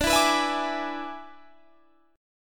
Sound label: E 7th D, guitar